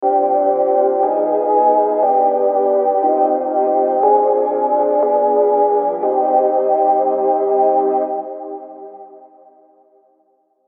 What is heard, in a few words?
Harmonic Minor Prog; Unison Harmonic Minor Advanced Scales MIDI Collection